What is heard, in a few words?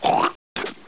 spit